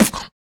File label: Human Perc, Body Perc